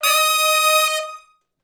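Hi Medium Short Note (Eb) unison, Horncraft for R&B Sections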